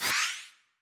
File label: Book Expansion